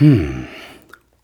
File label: HUM, Jew's Harp; Jew's Harp & Spoons